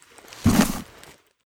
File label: mask use; STALKER; IWP; item usage